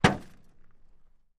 Metal Impacts, Light; Light Dull Impact. - Construction; pipes